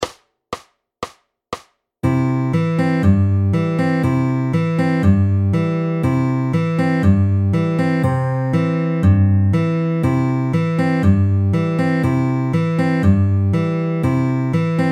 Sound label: All Pinch FAST, fingerpicking